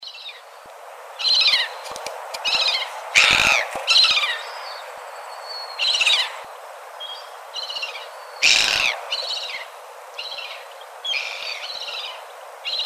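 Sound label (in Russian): чайка Буллера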